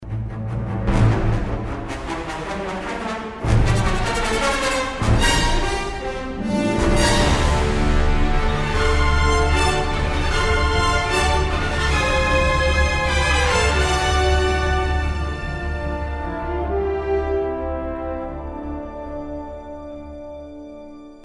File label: Solo